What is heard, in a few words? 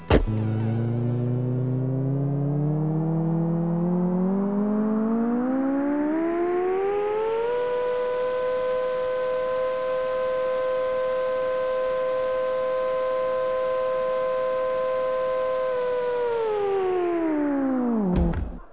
elevator